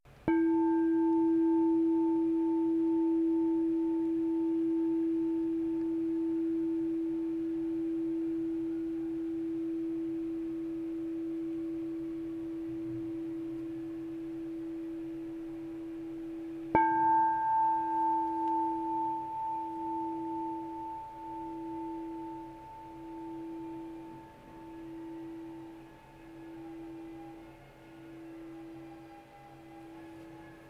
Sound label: 7inch E 10 Apophyllite w Pink Aura Gold Base and Platinum Rim Tall Bowl